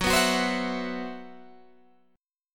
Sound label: C Major 7th Flat 5th F sharp